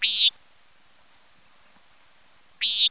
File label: nighthawk, songs